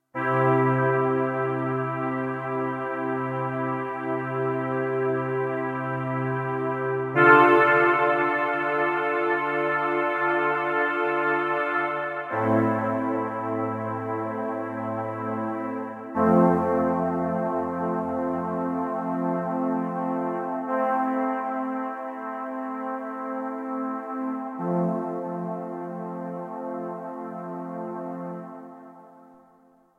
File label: Oberhym Pad; Kawai K1; Bank 2 card A